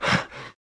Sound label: Orc Idle